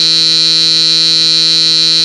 Buzz

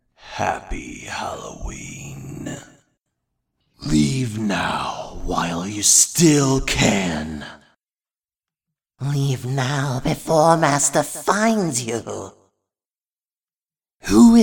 Halloween Vocals

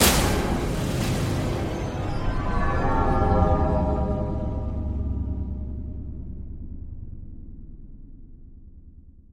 Eerie Horror Scene

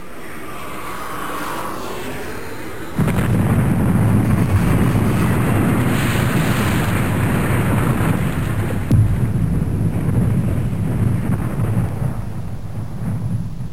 747 jet crash; keyWasFull

